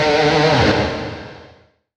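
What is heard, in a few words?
guitar (single note metal); Sonic Foundry (Sony Creative Software); Ugly Remnants VOL-2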